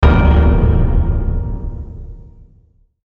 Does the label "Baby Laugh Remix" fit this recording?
No